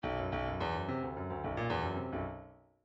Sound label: bdf midi file grooves funk k funk k